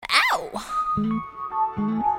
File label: Aww